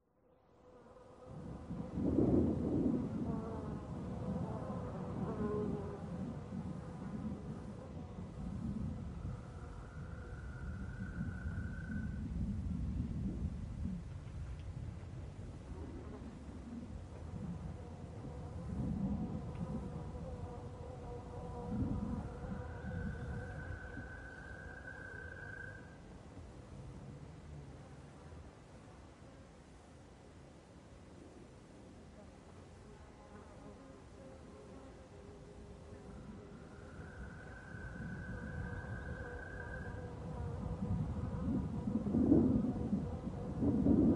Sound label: AMB INSECT FLIES CRICKETS BLUSTERY CANYON WIND; wildlife sounds